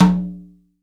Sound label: CONGA, STEREO CONGA